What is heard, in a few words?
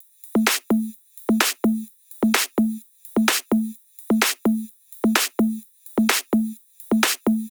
128BPM Drums; Vengeance Samples; Control Kit